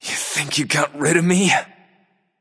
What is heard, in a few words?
death; smite; voicepack; rama